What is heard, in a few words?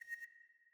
Move C8 Mp